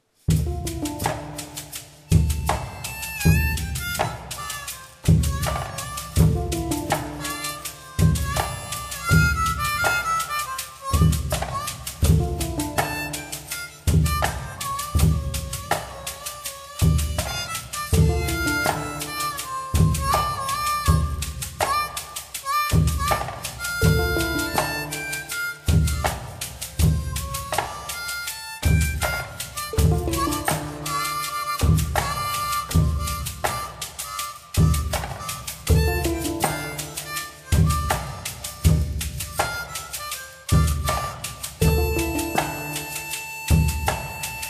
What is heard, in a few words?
healing song